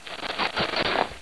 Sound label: crawl